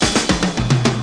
reggae break, DRUM LOOPS and BREAKS; FILLS and ROLLS

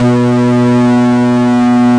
Synthesizer Middle C Notes, Lead

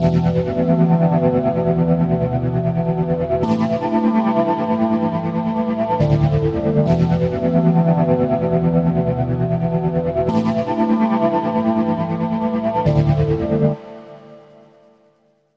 tremelo pad two chords; ORCHESTRAL and CLASSICAL

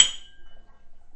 glass glass tap, Crystal Glass Strikes

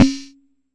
Jomox MBrane, drum machines; Jomox MBrane 11

